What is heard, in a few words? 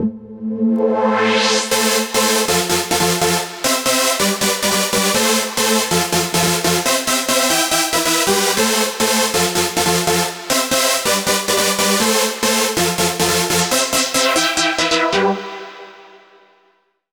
FX Vocal Guy Lead Synth, 140 BPM; Lead Synth2; Vengeance Samples; VTDS2 Song Kits; FX Vocal Candy Guy